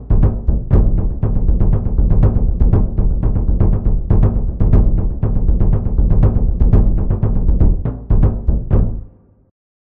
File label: brazilian surdo drum open